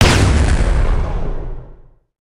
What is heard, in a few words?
pirate cannon fire